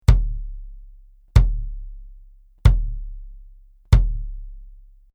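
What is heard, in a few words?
BASS Ctd Hard Plastic, bass drum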